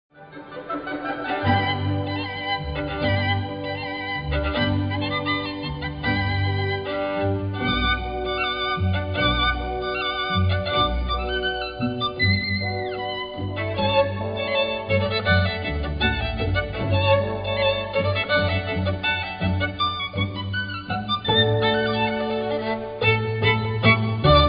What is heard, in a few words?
Classical Upbeat; on hold message music